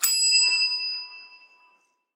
bell star

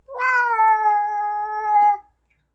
cat

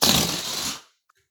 balloon off

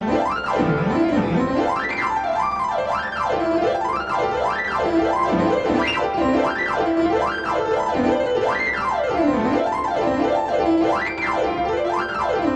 piano

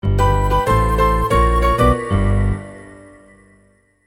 sound logo

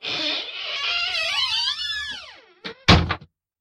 door close

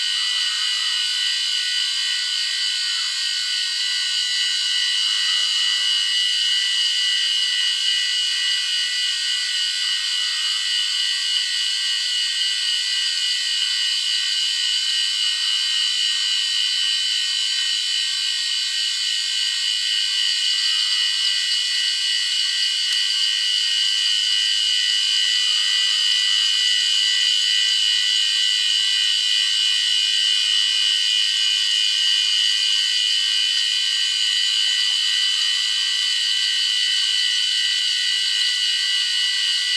pc noise

